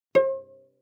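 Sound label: Cello pizz fortissimo sul A C5; MIS Pitches - 2014; Strings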